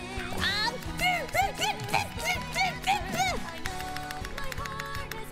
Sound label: malding noises BUBUBUBUBUBUU